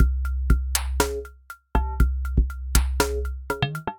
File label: Wood Kit, Drums Synthesized; Ableton; Factory Packs; Drum Essentials